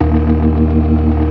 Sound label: ORGAN ALL STOPS; Keith Emerson Signature Series